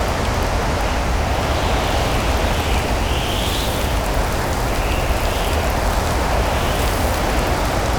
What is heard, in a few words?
sandstorm